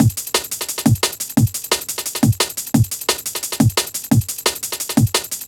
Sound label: classic dnb breaks, B, Lo, 175